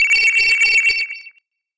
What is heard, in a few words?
Alien Cell